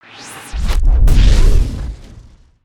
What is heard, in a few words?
super jump full